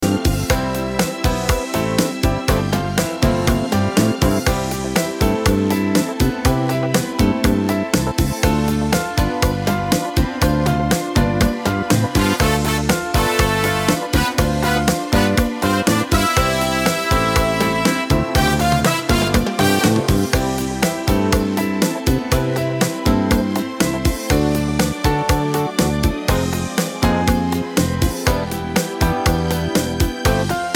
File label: cha cha cha